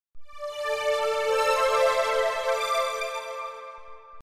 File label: strings